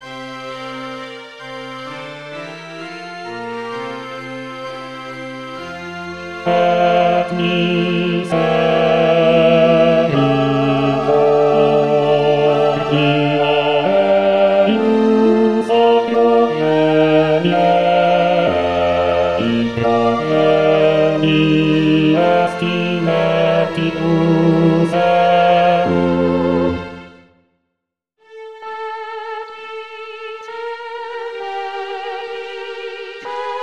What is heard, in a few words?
bass et misericor